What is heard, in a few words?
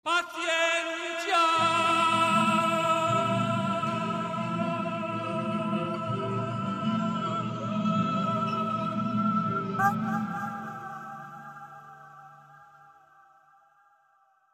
Flamenco